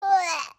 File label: cute blehh